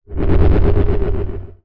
Oscillating Sci-fi Transition

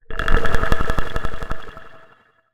Sci-fi Transition

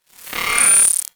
Digital Buzzing Rattle Glitch

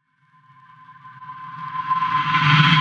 Hissing Approach

